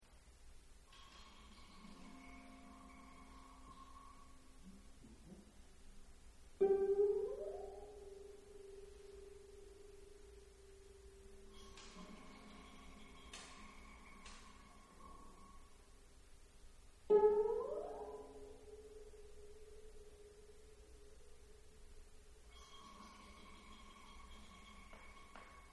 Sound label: Dance of the Night Insects